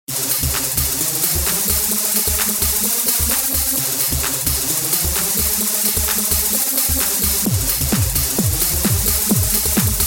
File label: ground zero electro